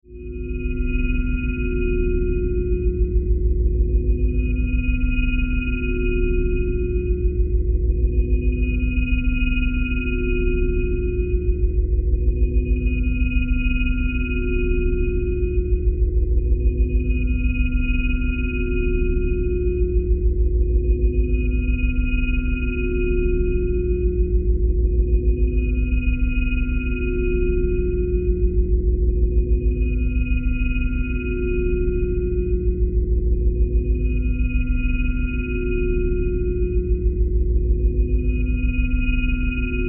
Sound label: Sci Fi Power Source Hollow Whine High Pitch Ring